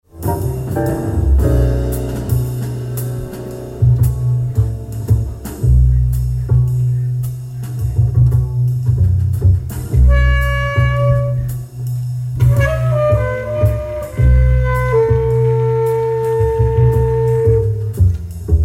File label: Tunji improvisation